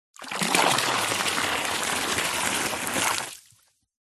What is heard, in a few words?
nature water pour medium amount deep